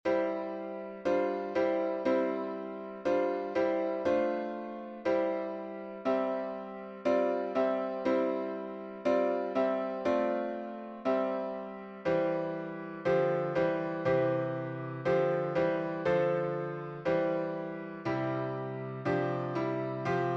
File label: piano synth; Little Free Music Library